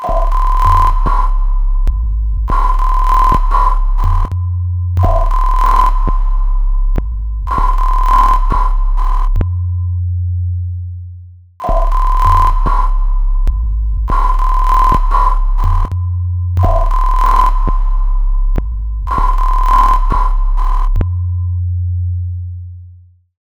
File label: SINGLE CYCLE 1K